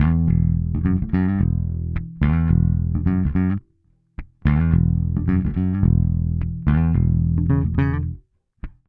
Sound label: Bass Hip-Hop